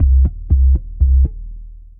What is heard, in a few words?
Sine Bass Delux; Ableton; Skitter and Step